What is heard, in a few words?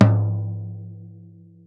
floor